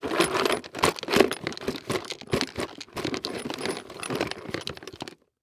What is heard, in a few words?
Industry Tool Box Search Through Box